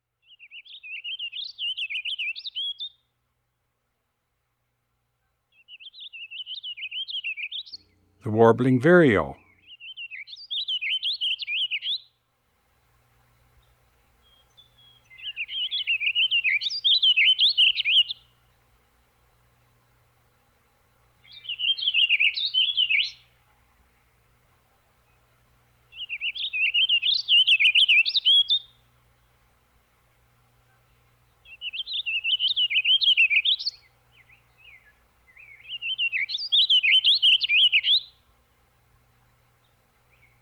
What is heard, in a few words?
Warbling Vireo; Eastern North America; Dan Gibson; Wildlife Identification By Sound